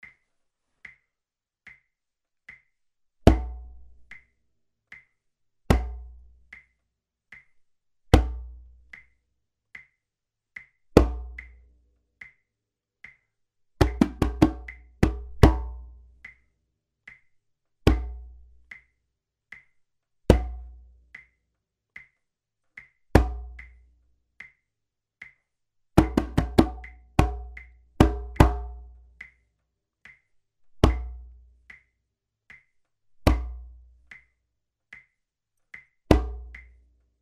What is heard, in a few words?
BASS